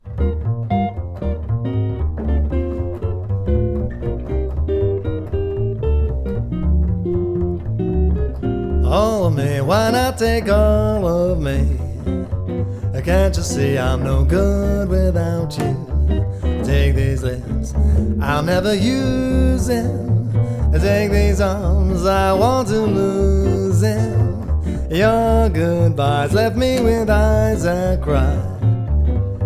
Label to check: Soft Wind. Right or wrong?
Wrong